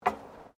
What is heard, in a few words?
FOOTSTEPS WOMENS LOW HEELS ESCALATOR STEP; Footstep Foley; Human